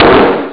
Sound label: shot; ST-XX Amiga Soundtracker Sample Packs